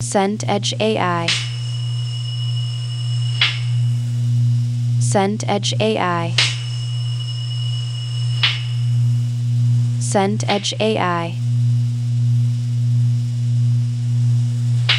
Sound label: amb gym